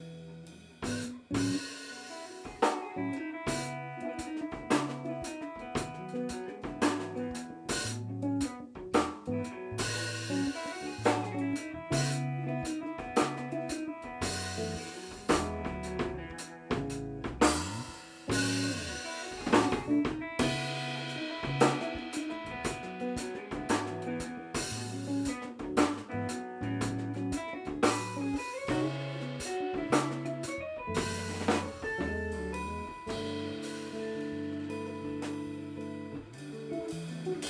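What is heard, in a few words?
Rehearsal Demo, bass; This rough rehearsal recording demonstrates a piece; Piece 6 - 'The All'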